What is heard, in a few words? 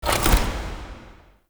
UI Mod Deactivate Countermeasures; Warframe; Railjack Player Ship